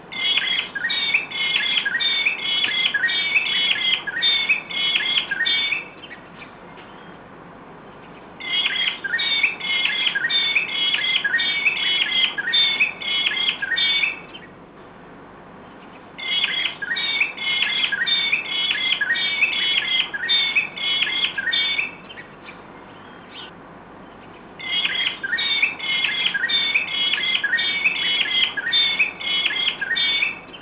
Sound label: peewee